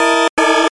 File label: Error